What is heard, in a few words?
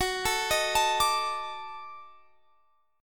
Eb Minor Major 7th Flat 5th Gb, chord